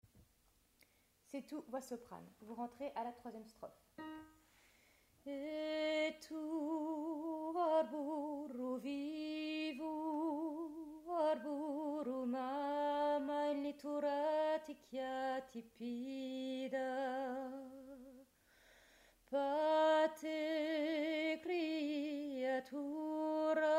Soprane; Se Tu

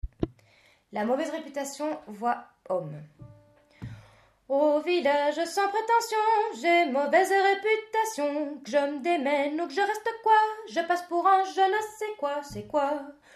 Hommes

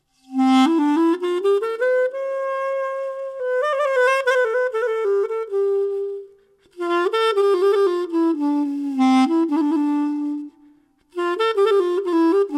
clarinettes

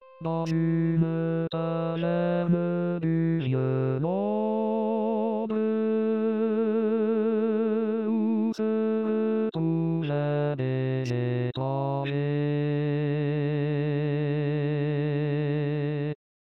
basse

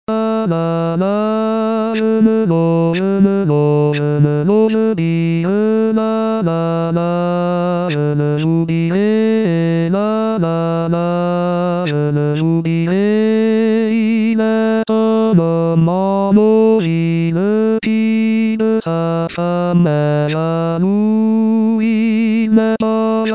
Basse; Certon

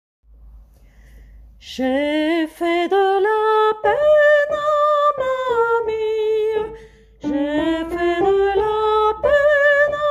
sopranes

